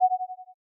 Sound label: poison; noteye; roguelike